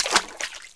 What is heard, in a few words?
foot, ambient; Shadowman